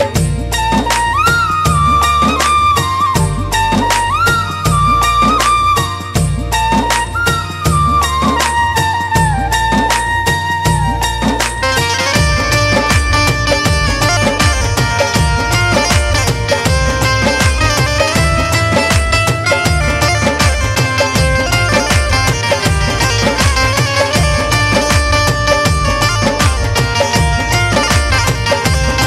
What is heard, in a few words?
Bansuri Music; Krishna Ji